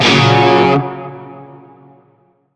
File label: Shawzin Hirajoshi Chord; Warframe; Narmer Shawzin